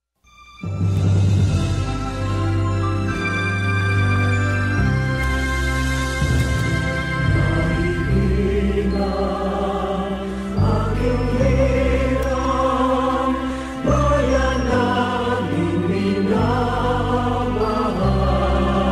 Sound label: marikina hymn